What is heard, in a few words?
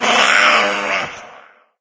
idle, cherub; doom3